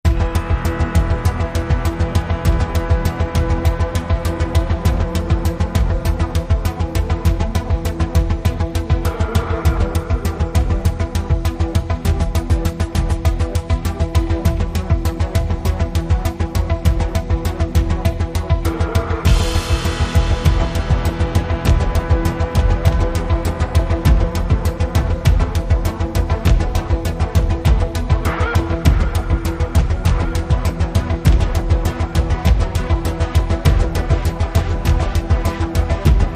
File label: No Ebow Guitar